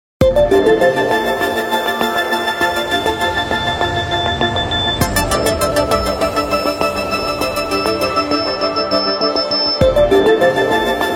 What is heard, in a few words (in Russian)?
originalnye ringtony nokia 5310 xpressmusic